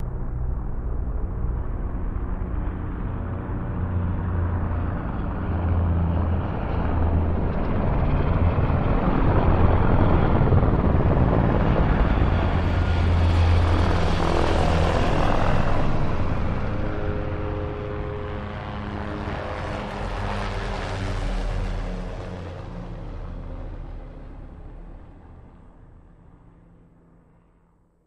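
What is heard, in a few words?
Cobra Heli Fly By Right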